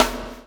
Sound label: CHAIN; Spectrasonics - Backbeat